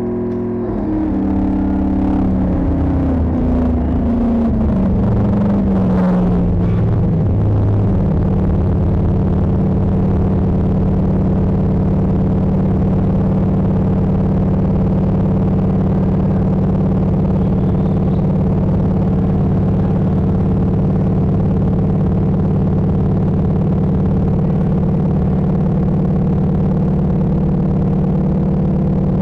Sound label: Feedback; Chicago, IL